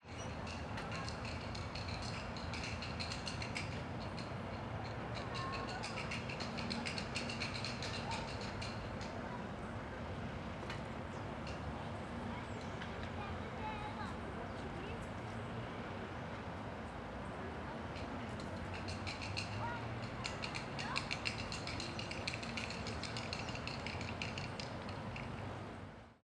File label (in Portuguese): Brinquedo Barulhento em Parquinho da Asa Norte; equipamentos